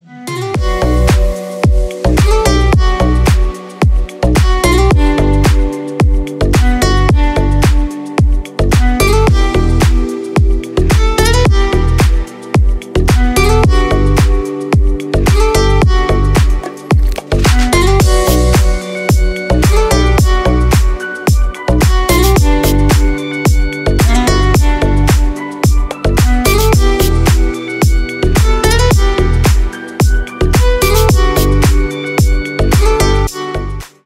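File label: loud beautiful melody for ringtone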